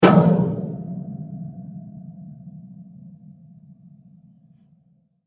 pulse, Sound effects created by manipulating other sounds; Rise And Dive; Rises and Dives